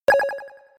alerta

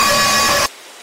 jumpscare scary

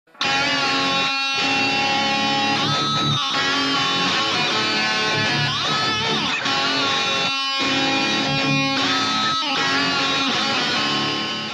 talk guitar

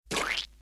splater

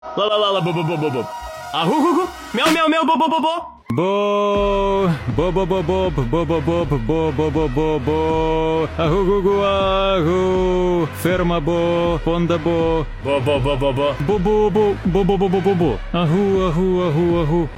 bo bo bo agu agu bo

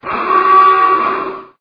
alien alarm; insaniquarium

